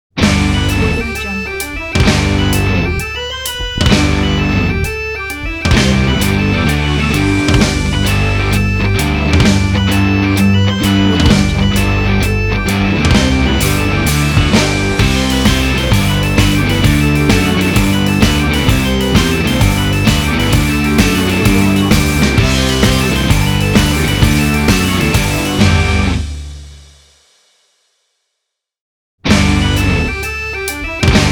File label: octoberfest intro ident